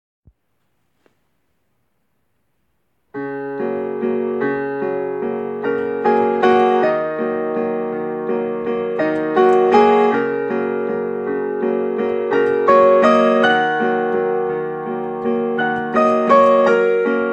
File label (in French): Valse chinoise